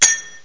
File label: fingercymbal2, indianperc; Octamed 6; walkabout